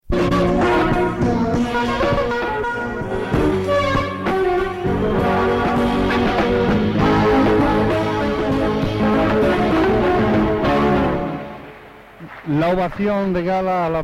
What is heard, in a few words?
Concert